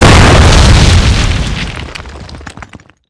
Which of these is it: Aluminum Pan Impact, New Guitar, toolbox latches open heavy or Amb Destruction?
Amb Destruction